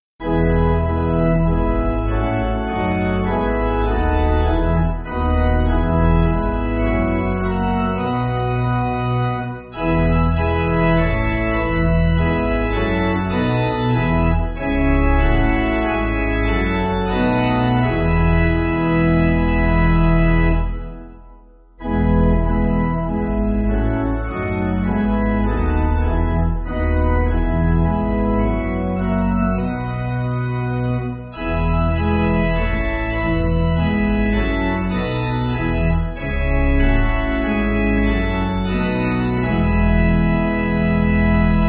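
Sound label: Jesu We Love Your, pipe organ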